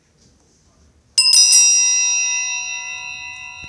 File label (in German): Barock